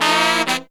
132, Pop & Funk Brass

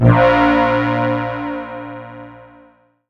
BELL-GONG, A#; Big Fish Audio

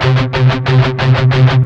16TH C#3 L, Guitars; Spectrasonics; Hans Zimmer Guitars VOL-1; MED 16TH

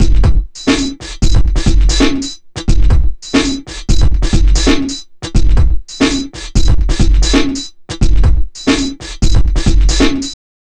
wallcracker 090bpm, downbeat dance grooves; Zero-G

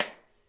SNARE SD STK; Sonic Reality; Interactive Drum Kits

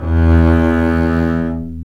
STR ARCBS, Cb Bowed, mf vb; String Master Series

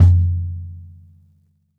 bop kick - snares on; JazzFunkKit

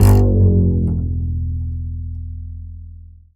Upright Acoustic Bass

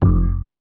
FX Thumper, Drum N Bass; Sonic Foundry (Sony Creative Software); Knutrix Drum & Bass

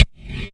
percussion, Severe Gate Kit; Dance Synths

